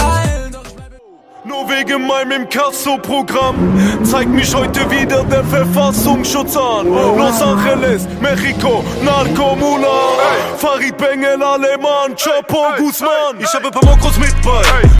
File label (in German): deutsche rington